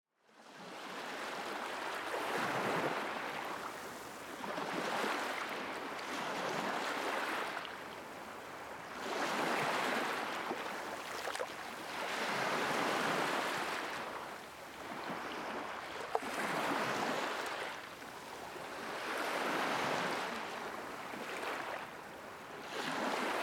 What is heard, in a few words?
waves lapping on beach closeup; Stille bølgeskvulp helt tæt på, 30 minutter